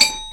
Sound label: timer bell